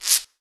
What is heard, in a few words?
GUIRO LOW, SR-16 DRUMS; EdgeSounds